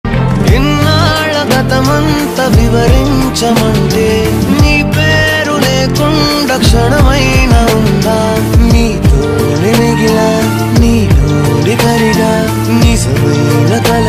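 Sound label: telugu background music ringtone; life of pandu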